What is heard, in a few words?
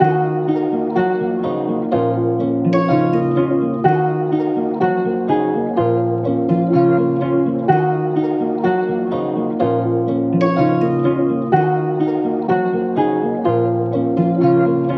125 BPM A# Min Guitar, melody loop stem; Cymatics; 2022 Melody Collection; Tantra Pt 2